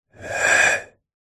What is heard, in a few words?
human breath out exhale slightly sinister close up; zapsplat